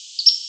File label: song